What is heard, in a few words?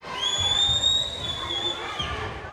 sports crowd reactions whistle med dist ball bou